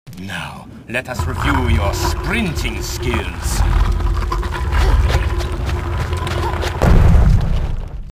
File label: Door SFX